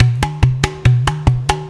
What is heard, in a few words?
WATERCAN; Best Service; World Colours